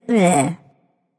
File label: tongue; smite; aphrodite